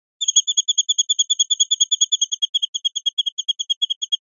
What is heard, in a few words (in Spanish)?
rep tiriteos, pardillo